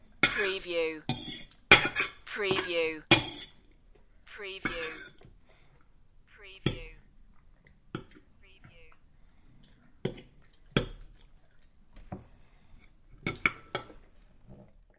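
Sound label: Silverware Scrape with Chewing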